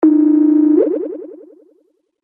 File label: Call Ringing; ICQ6.5